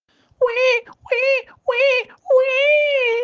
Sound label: mueeeee